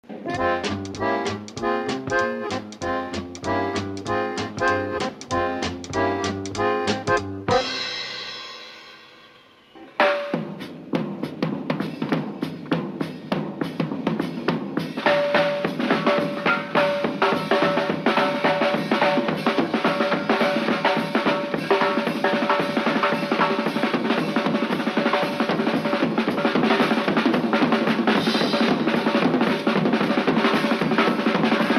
drum solo

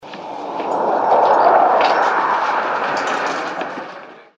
jet

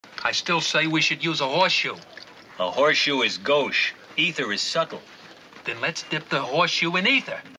horse shoe

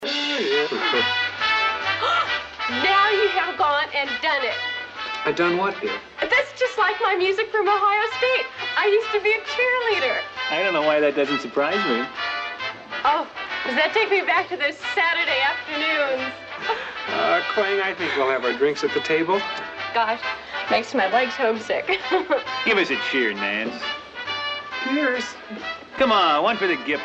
give us a cheer

